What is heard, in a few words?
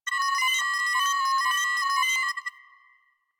Venus Corpus Data, Ambience; Warframe; Venus Open World; Venus Landscape